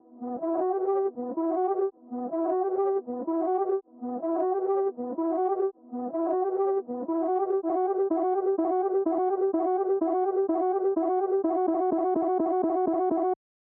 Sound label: Melody, Break, DRY, 126BPM, Em